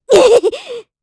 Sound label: Vox Damage, kr; Hilda; kingsraid